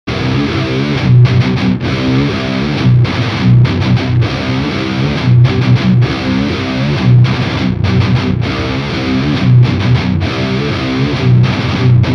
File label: Iratus Lead Shape Off; TappAmps Iratus